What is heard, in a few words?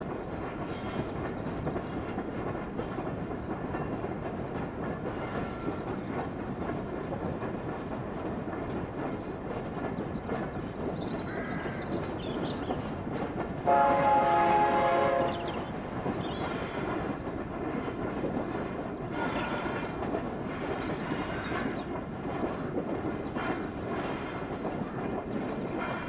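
ambience american midwest train loop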